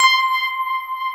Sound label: SYN K-2000, Roland